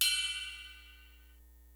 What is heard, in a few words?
GREASY KIT, DRUMS; Kid Nepro; Elite